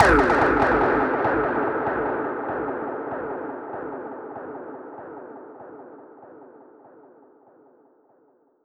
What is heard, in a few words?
Perc Hit, dub percussion; musicradar